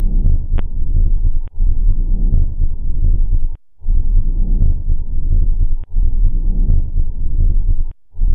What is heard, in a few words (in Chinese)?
肺部听诊 正常肺泡呼吸音